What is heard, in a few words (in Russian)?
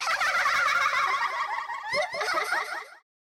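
malyshi khikhikayut na arene; zvuki klouna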